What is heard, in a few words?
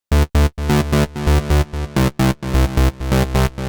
Bass Buzz 130; Audacity; loops